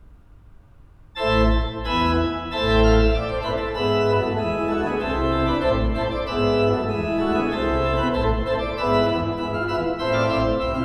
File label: A minor, organs